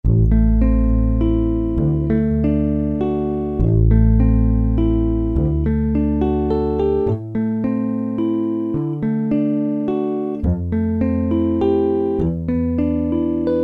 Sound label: Deep Chorus, Alesis MidiVerb II